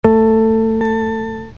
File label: Electric Sound